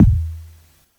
long tail reverb steel kick drum sample e key

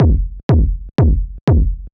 percussion synth massive kick zone Fm, hardgroove

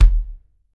solid kick drum one shot D# key; D sharp key

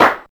hand clap sample g sharp key; single hits one shots

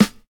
dark sounding acoustic snare sound g sharp key

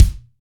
acoustic bass drum single hit F# key

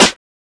00s airy sizzle snare one shot d sharp key; snare drum samples

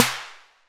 reverb snare one shot a sharp key; snare drum samples

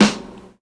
short reverb snare one shot G sharp key; snare drum

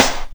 original snare drum sample g key; snare drum samples

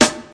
clean rap acoustic snare sample a sharp key; snare drum samples

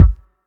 long room reverb bass drum F sharp key

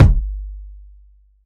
fat kick sound g key; kick drum samples

